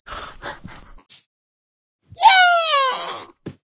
Water splash to clean

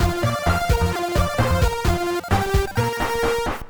stage clear!; Jack 'n' Hat

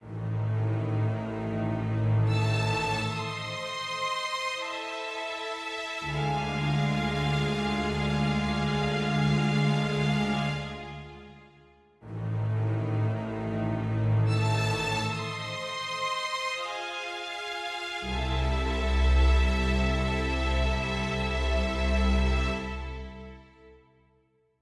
dark forte; Quivr; PS4 Windows gamerip 2016

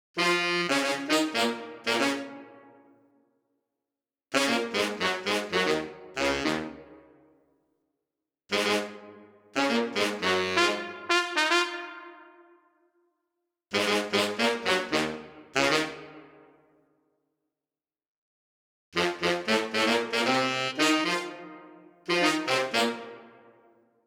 fluffy disco rhythm

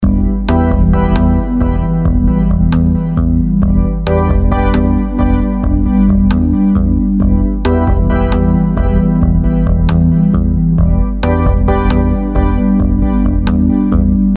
Festive; Just More Doors

